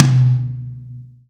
drum hit clap

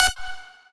lead

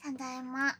applause; osu

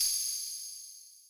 BELL; osu

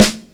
soft hit clap

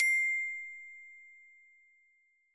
glockenspiel C8

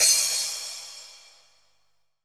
cymbal

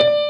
jazz D5 Quarter note

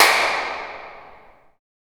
reverby clap

